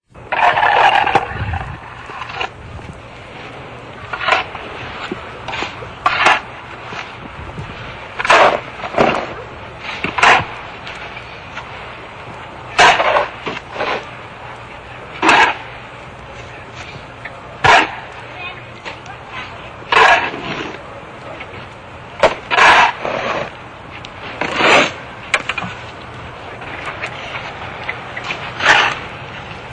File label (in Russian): rabota lopatoj